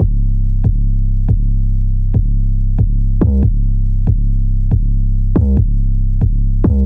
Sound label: 808 Bass C 70 bpm; Quasar